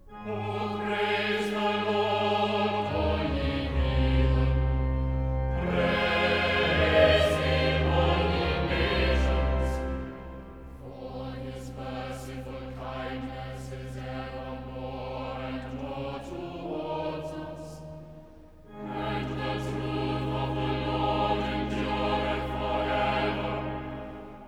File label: Cathedral Choir Laetentur coeli